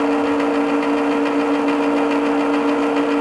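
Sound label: crane arm loop; machines mechanical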